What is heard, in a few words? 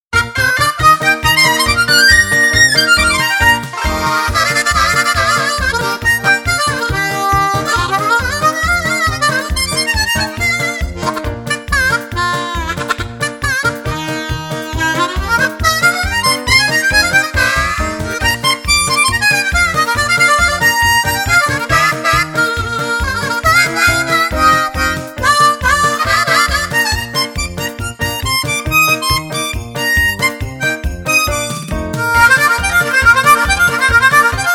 jingle bells; harmònica; blues; Víctor Uris